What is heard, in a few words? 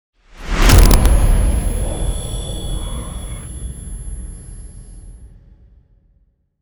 deep dark hit swell